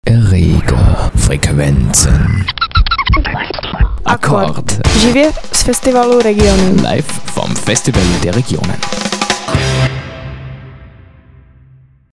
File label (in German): akkord